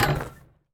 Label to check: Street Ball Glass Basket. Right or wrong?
Right